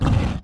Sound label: damage, monster; metin2; fire tiger